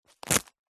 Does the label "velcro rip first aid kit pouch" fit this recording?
Yes